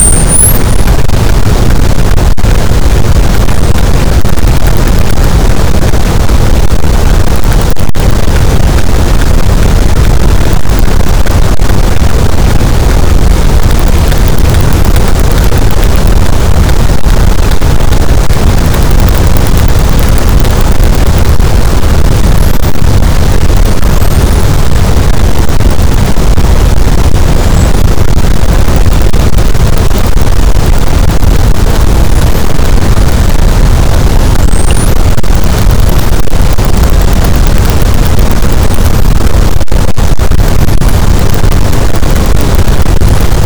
cachalot positif, boat, Physeter Macrocephalus

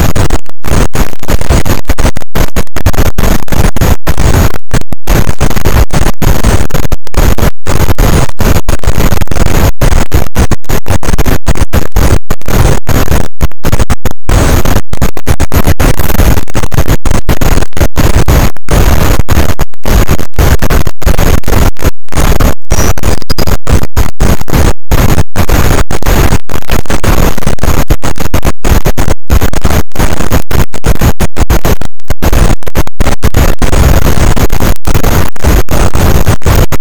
cachalot positif, boat; Physeter Macrocephalus

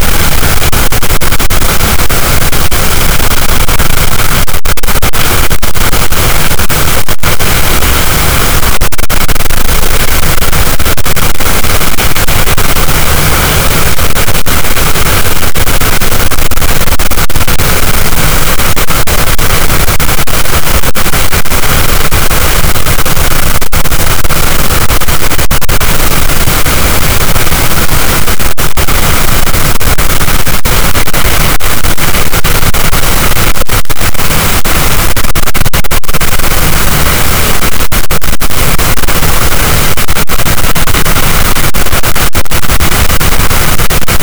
cachalot, Physeter Macrocephalus, gaussian noise

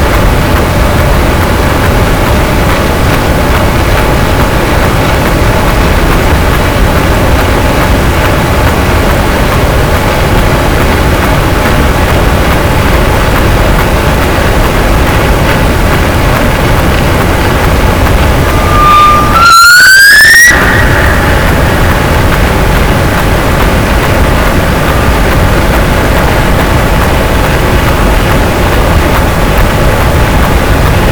cachalot, boat; Physeter Macrocephalus

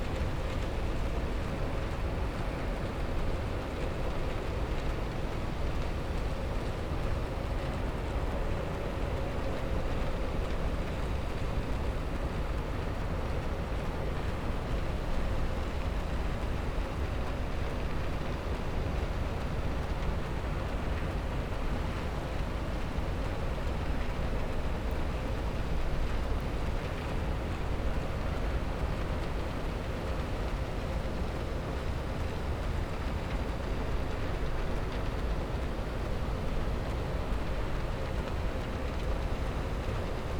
cachalot negatif, Physeter Macrocephalus

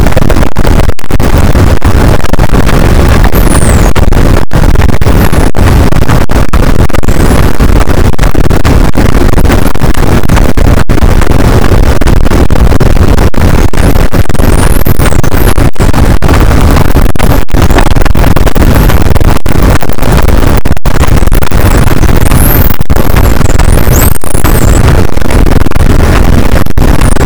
cachalot negatif, boat, Physeter Macrocephalus

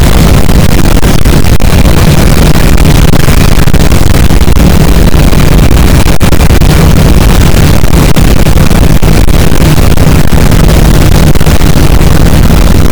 cachalot negatif, boat, Physeter Macrocephalus

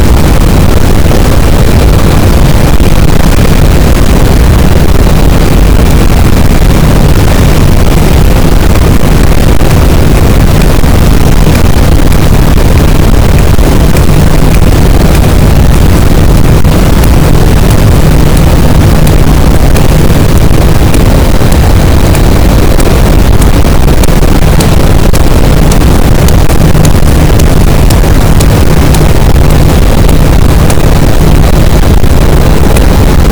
cachalot positif, boat, Physeter Macrocephalus

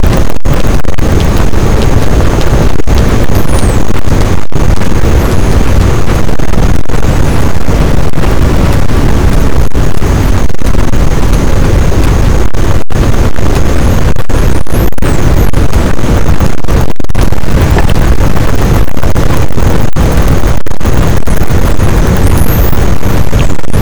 cachalot positif, boat, Physeter Macrocephalus

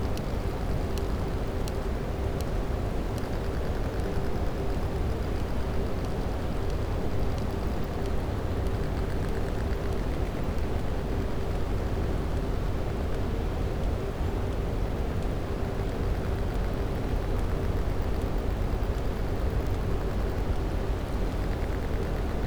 cachalot positif, Physeter Macrocephalus

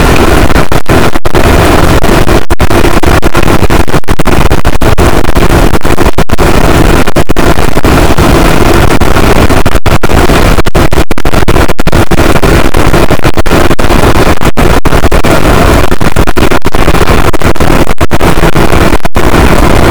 cachalot negatif, boat, Physeter Macrocephalus

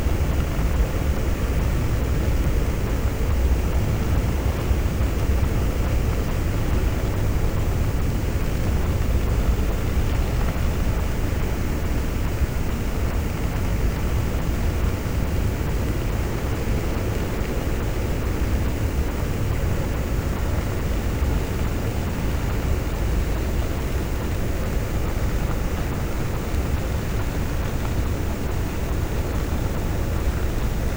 cachalot negatif, Physeter Macrocephalus

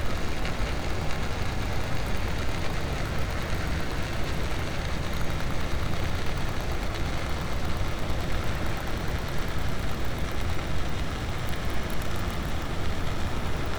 cachalot positif, Physeter Macrocephalus

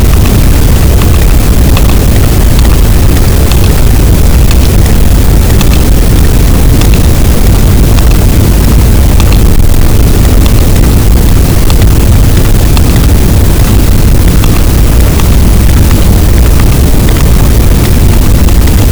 cachalot, Physeter Macrocephalus, gaussian noise

